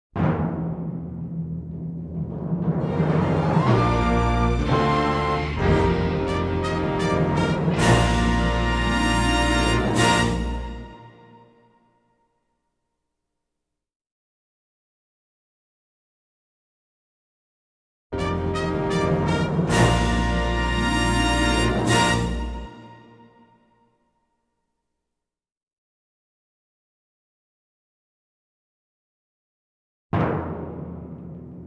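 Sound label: Fanfare - Instrumental; Network Production Music 092 Above and Beyond (Multimedia)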